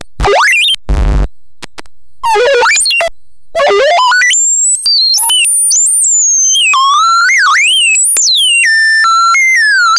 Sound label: the sounds of, violin